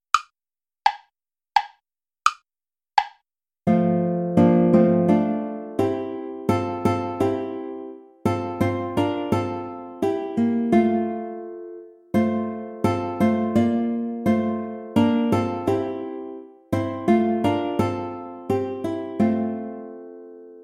lord sing with lips and heart play along without guitar